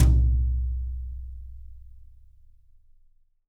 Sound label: YAMAHA, brush drums; Big Fish Audio; Ross Garfield Drums VOL-2; T-YAM BRUSH